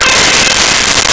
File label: Charang C5; Nintendo; July 2020 Gigaleak